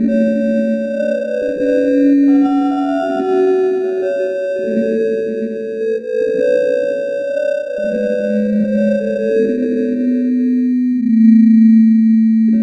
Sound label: texture